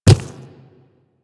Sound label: Quadruped Medium Walk Default, footsteps; Warframe; Infested